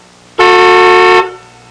car horn; Sound Library 2000; Wayzata Technology

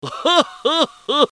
comic, laughs; Kaboom! Junior; human

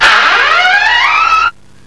squeak

